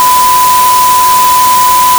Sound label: 1k sin dithered